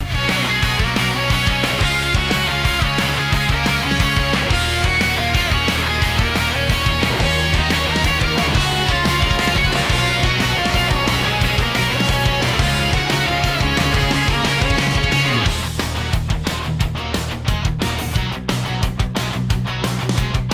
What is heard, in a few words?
loop